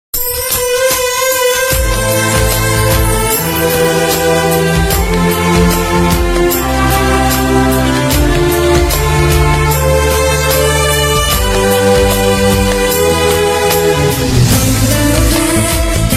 song bgm

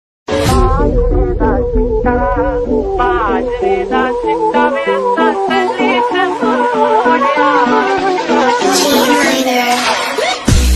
english hindi bgm ringtone

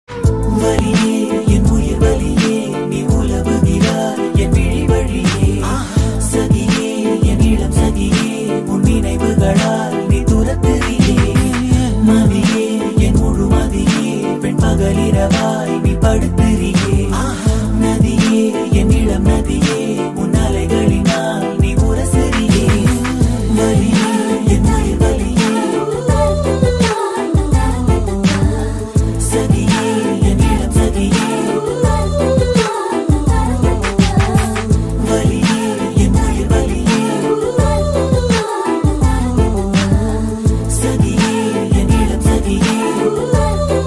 tamil love ringtone, bgm ringtone; caron tunes

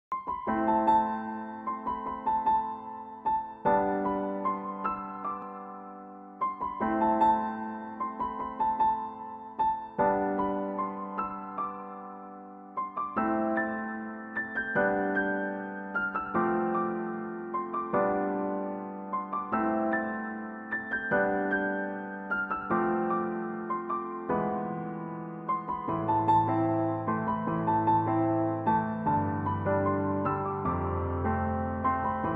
love bgm

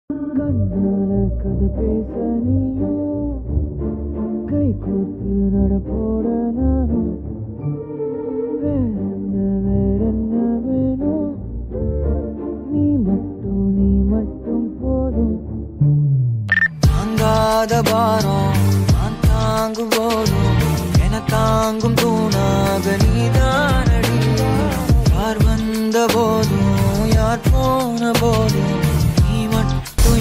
tamil love; thiruchitrambalam

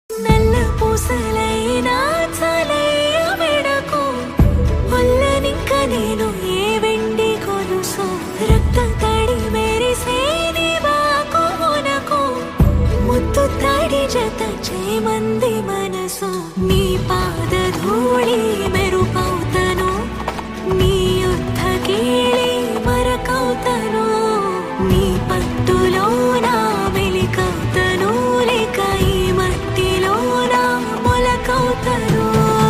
female song

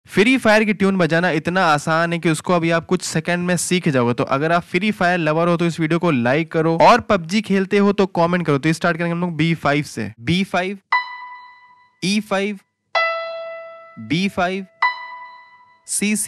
tune, game song ringtone; Free Fire; BGMI; PUBG

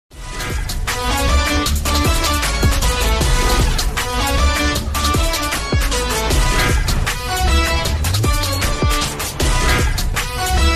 bgm ringtone tamil ringtone; thalapathy vijay

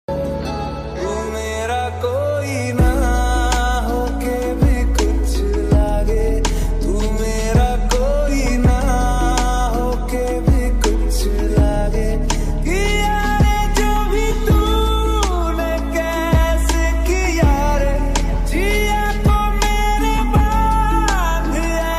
movie best call ringtone; bhediya

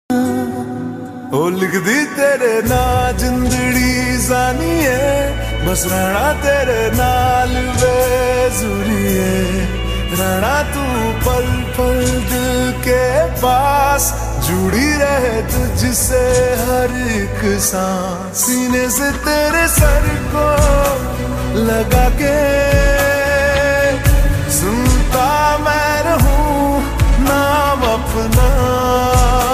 hindi mix songs; korean school love story

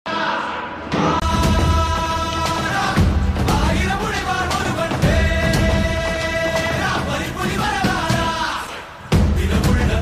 lyrics ringtone tamil ringtone bgm

